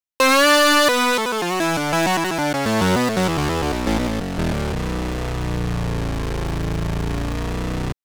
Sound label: tri sync; fabfilter twin2